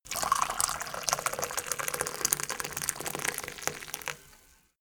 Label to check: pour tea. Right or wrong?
Right